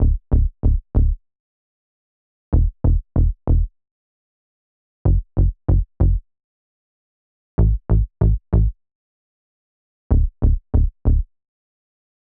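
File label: F minor 95 BPM synth bassline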